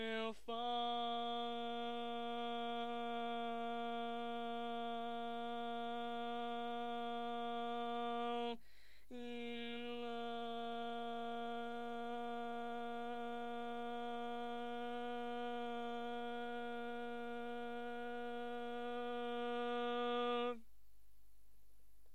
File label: Lead, barbershop tags